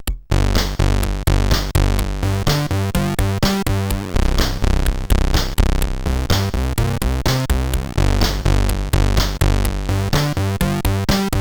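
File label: Lights On, MOS CSG8580R5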